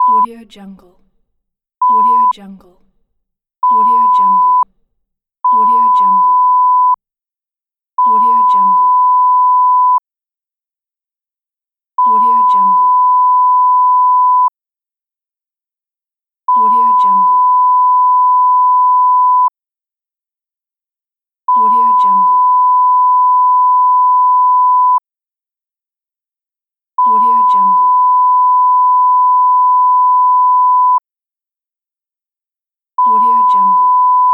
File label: censor beep